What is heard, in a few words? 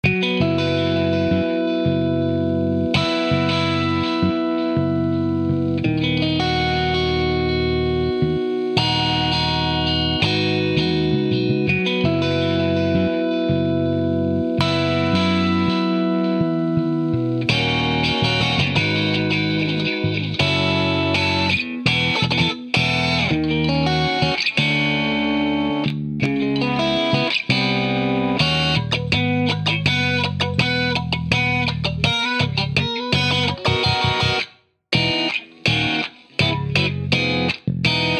guitar